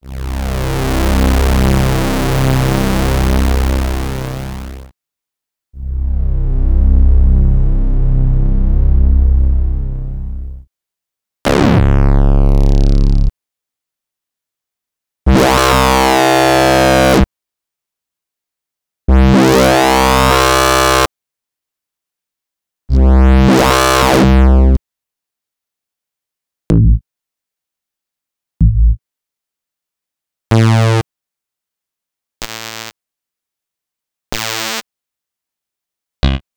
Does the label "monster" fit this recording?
No